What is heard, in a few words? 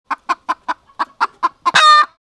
Chicken clucking